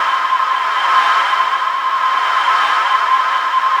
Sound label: WINDY CITY; Distored Reality